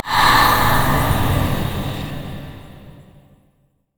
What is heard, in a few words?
transfusion heal; LoL; vladimir